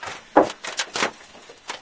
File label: bump, materials; ambient; Source Engine; video game